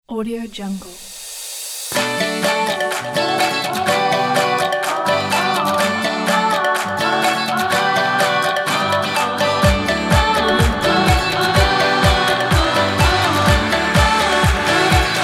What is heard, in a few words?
happy